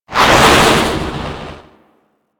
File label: Space Pod Random Thrust B, Vehicles; Grineer; Warframe